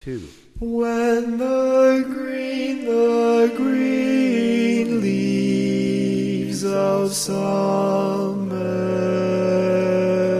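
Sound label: Baritone, Barbershop Tags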